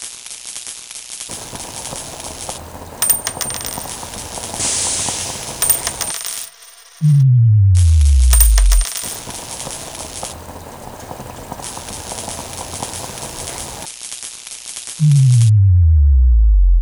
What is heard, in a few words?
electric